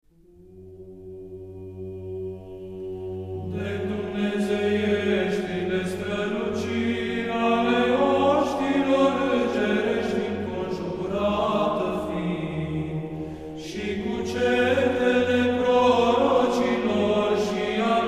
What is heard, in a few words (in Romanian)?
Sedealna 'De dumnezeiestile straluciri' glas 3